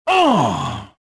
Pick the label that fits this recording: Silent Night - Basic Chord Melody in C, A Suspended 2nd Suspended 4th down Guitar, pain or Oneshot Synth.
pain